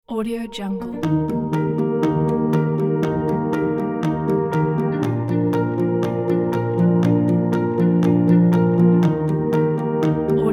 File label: dream piano; cleanriver